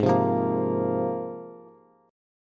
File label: Ab 6th Add 9th Ab Bass; strummed; JGuitar